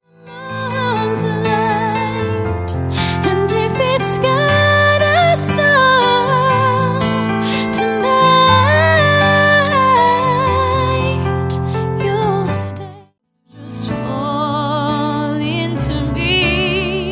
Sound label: Soft Rock